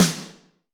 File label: Snr Darker, Alesis D4